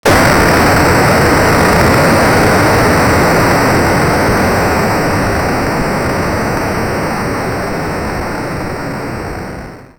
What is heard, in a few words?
pixel gamefire blade sound